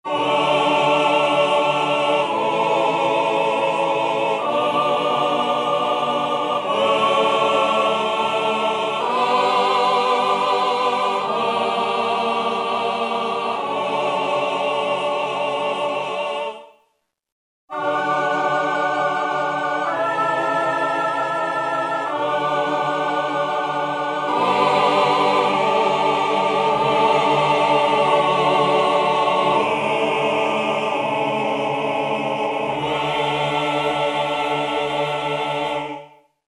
choir; Guess the sampler game

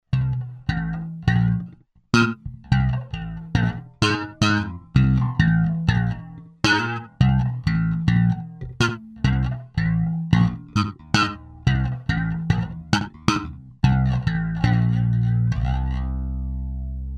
SLAP SOLO, Zoom 506 II

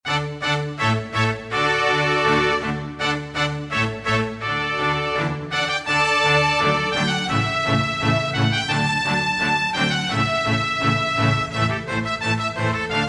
Scoring Barocco, Korg TR-Rack

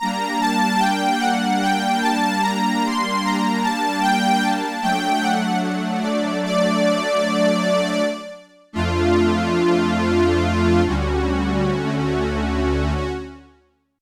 Dual Strings Prophet, Prophet 5; Yamaha TX16W

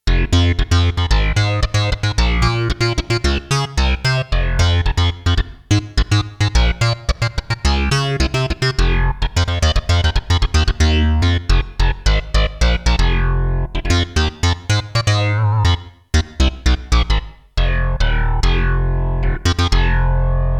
SYN BASS, Roland JX-1; Factory presets

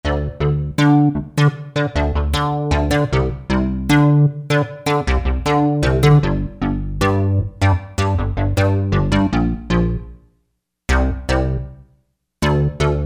Syn. Bass, Korg MSC-2S Synth; Synth 1

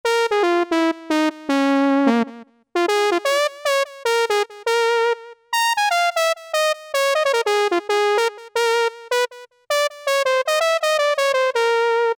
Synth, Korg MS2000; Factory Patches

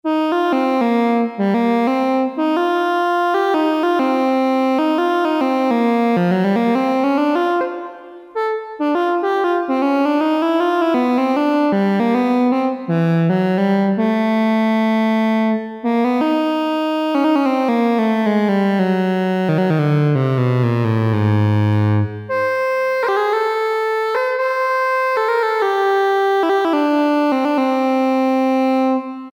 Alto Sax, Farfisa Syntorchestra

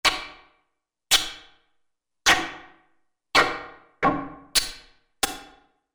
Peel Off!, Korg MSC-14S Sound Effects

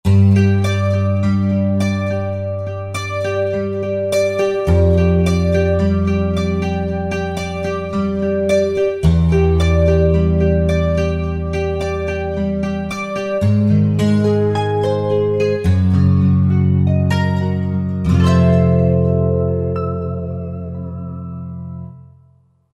Dulcimar & Gtr, Roland JV-2080

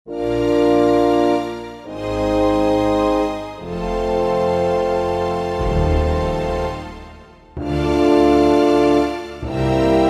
Orchestrator, Kurzweil K2000 Orchestral ROM

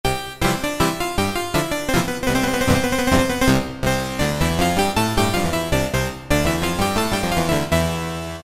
Antique Harpsichord, Roland PN-D50-04

